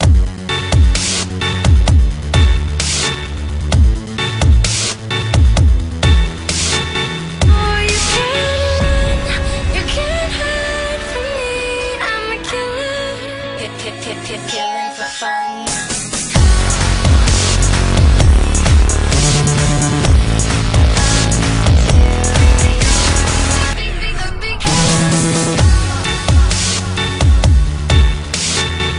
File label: Killer Dubstep